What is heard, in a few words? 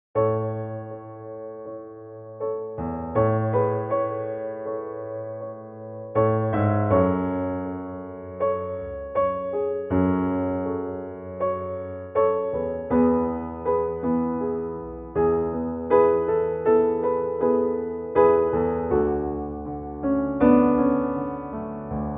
I vi V IV Piano A